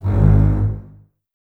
Basses G#1 Detache; String Ensembles; Miroslav Vitous; 90 s Sample CDs

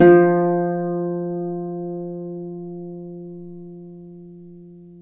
Celtic Harp, Plucked Strings

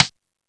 RIM M1, DRUMS; East West; Global Sounds

